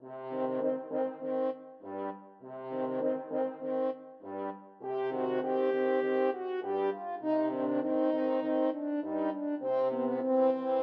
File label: Toreador Song horns